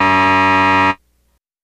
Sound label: Buzzer Elect Game S, electronics